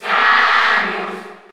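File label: Cheer Spanish, crowd; Samus; SSB4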